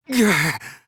gahh; gonta

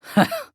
heh; sakura

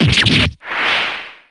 super damage

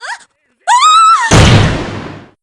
dynamic; p4u

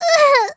sob; saionji

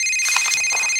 phone right; florent

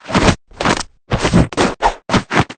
dance; cabanela

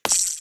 grapple up; bobby

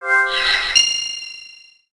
logic connect

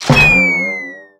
slide away

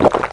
chug; godot

